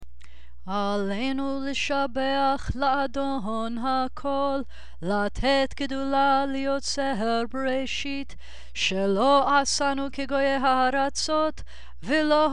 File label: Aleinu sung; Bnai Mitzvah Requirements; Service Practice